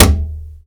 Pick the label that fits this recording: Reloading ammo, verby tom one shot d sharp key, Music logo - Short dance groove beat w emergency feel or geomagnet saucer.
verby tom one shot d sharp key